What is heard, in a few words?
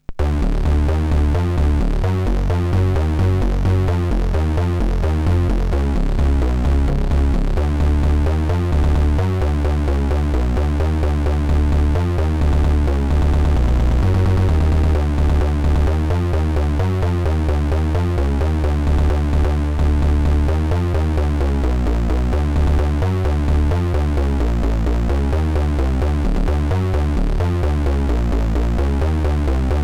industrial ebm